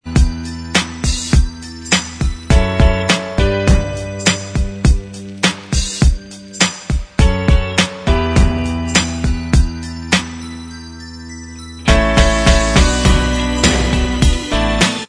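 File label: instrumental, backing tracks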